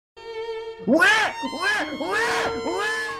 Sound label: waah; torchlight; rexy